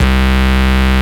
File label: Ultimate Bass Root C; Vengeance Samples